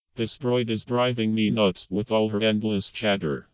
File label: storm